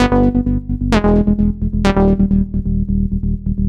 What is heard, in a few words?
Gated Synthline (130BPM); musicradar